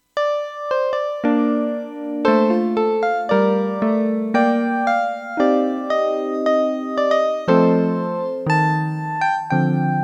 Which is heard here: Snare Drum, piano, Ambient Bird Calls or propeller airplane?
piano